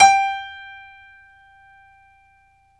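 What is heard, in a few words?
piano, Grand piano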